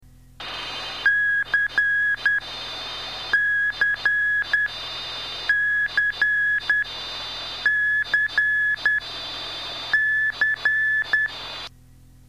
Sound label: 13610 kHz